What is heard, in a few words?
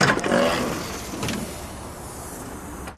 Hospital Door Open A, pneumatic